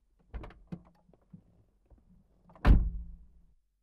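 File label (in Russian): двери автомобиля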